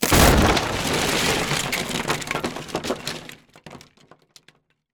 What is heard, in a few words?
time travel bridge crash; teardown; ps5 windows xbox series xs